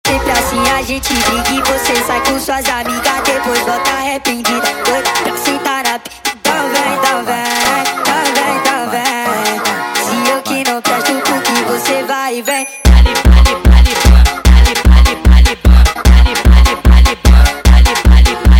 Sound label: sped Up